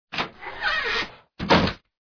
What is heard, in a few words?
Exit Room